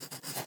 drawing, crisp, paper, notebook, pen, stroke, scribble, short, steady; Eiravaein Sound; Parched